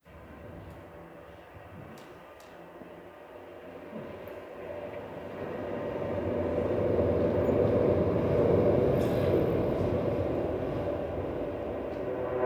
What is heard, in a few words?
Plane Song without intro noises